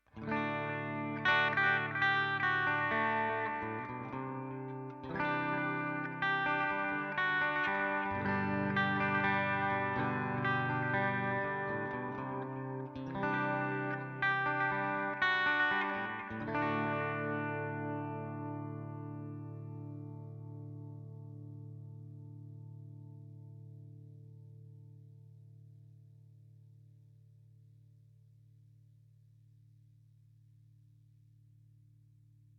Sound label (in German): Les Paul Clean Bridge; guitars